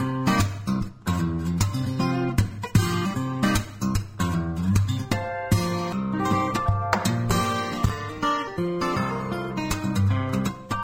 guitar, Phonky Loops

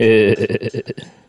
laugh

